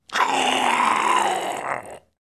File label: Zombie death